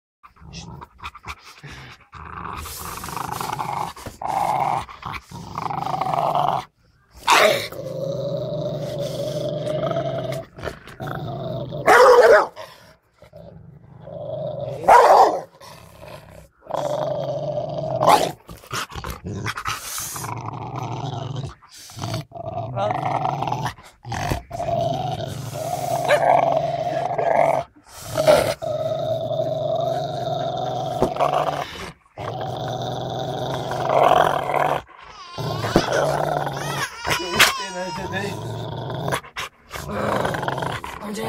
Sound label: angry dog defend